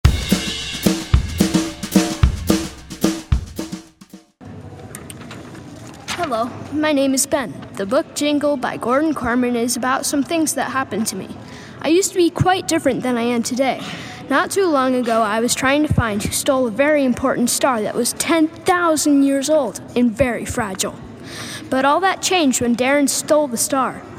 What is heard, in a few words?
jingle